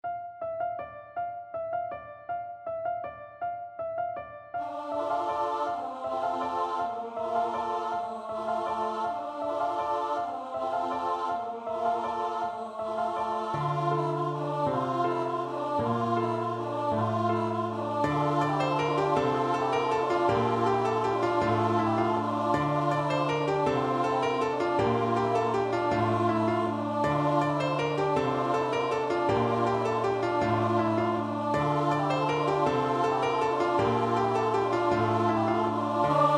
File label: Carol of the Bells piano and voices; CHRISTMAS